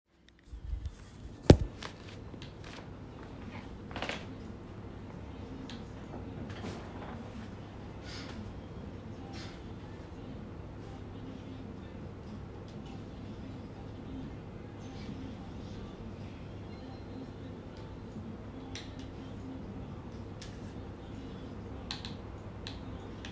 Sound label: Field recording Study Time; Location: Main Dining Room at Bits and Bytes